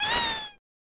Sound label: Cat Meow; gegege no kitaro 1993 snes